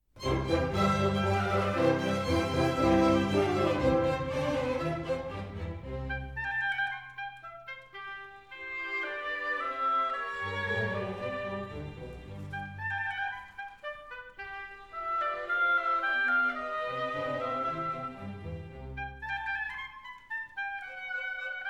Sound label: Oboe Con C